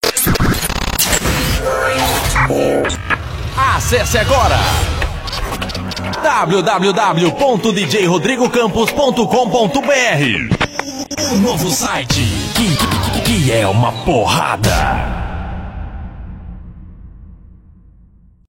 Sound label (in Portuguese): Open Bar Sertanejo